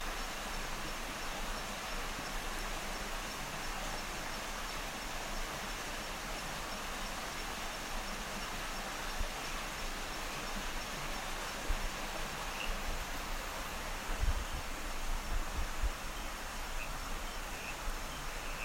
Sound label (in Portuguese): floresta